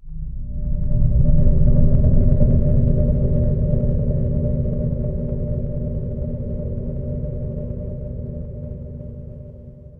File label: horror drone